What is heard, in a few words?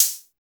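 INHALE closed hi-hat; Drums In Your FACE!; BONUS HATS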